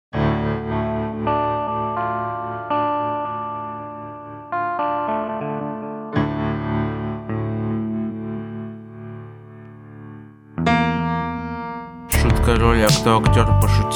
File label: beat